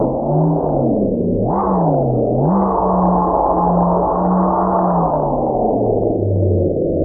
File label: loop, techno atmos